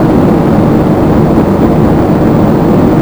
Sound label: wind; FS9; PF2K